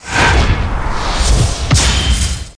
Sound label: b bing; battle